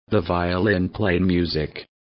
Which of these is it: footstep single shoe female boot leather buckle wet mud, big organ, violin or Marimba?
violin